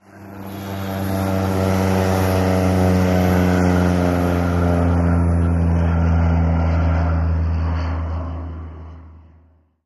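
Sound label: Prop Airplane By Short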